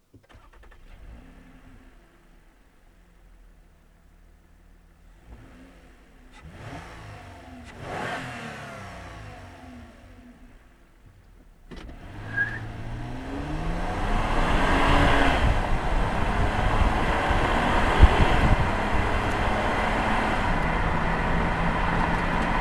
Toyota Avalon

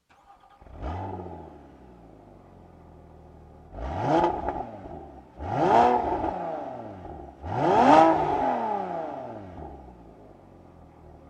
Porsche Boxster Spyder PDK

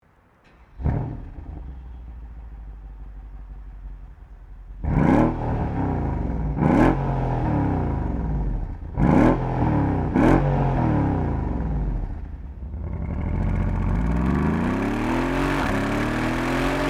Mercedes Benz CLS63 AMG

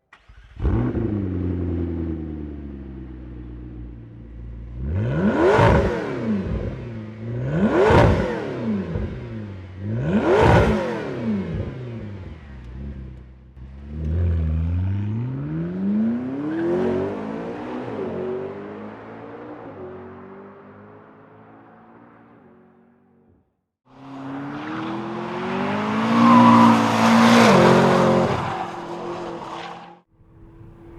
McLaren MP4 12C

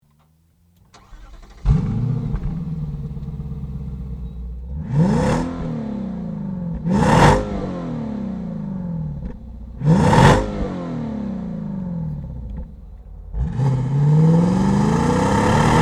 Infiniti M56

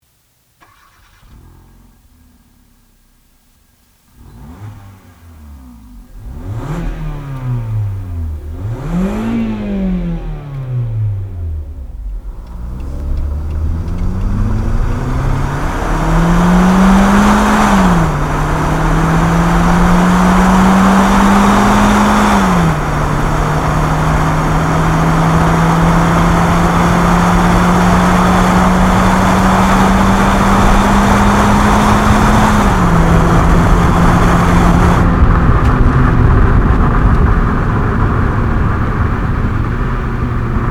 Hyundai Accent

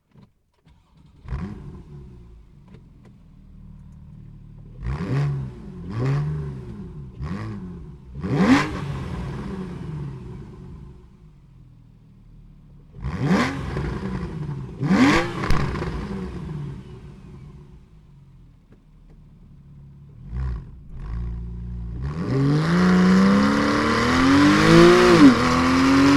Porsche 911 Carrera S

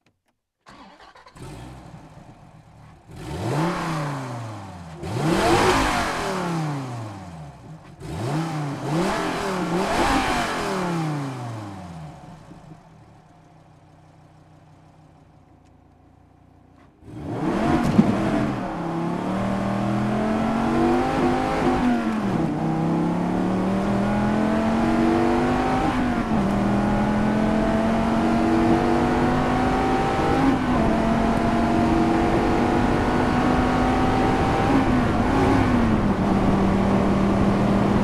SRT Viper GTS

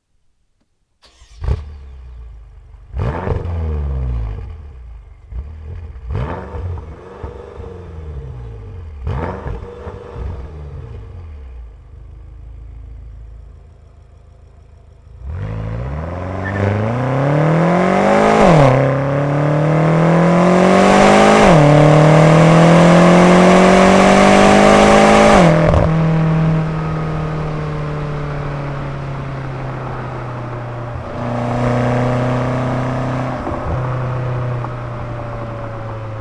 BMW M6 Convertible

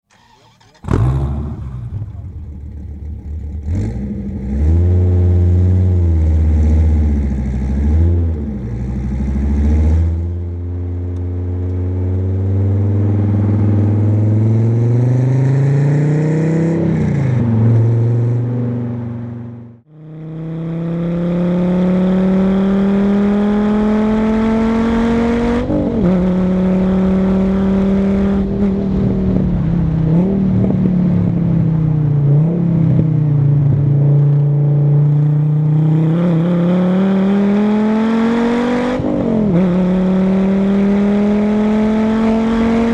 Dodge Viper SRT10 ACR X

